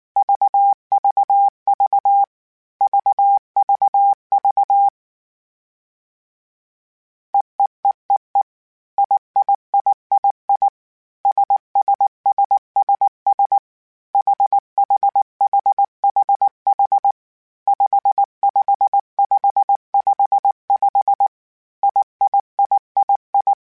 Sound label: All Groups, Morse Code; CW Code Groups; All Groups Concatinated Blocks; HamRadio; Basic Sets